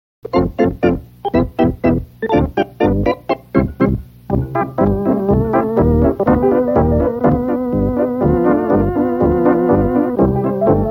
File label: patty cake polka (instrumental)